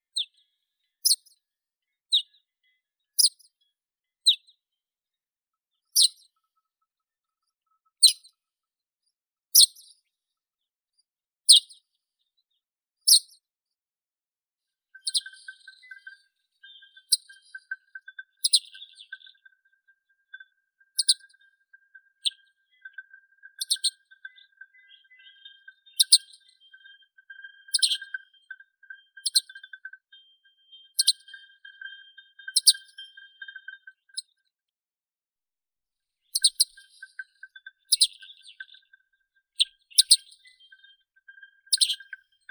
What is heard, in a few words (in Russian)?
ovsyanka krasnokluvaya emberiza caesia